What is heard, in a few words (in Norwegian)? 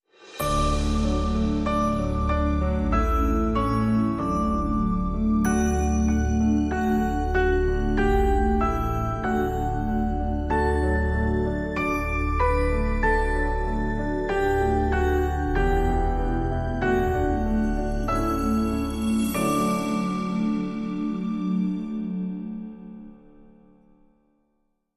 Deilig Er Jorden (Norwegian Christmas Song Based On Schesien Melody), Verse 3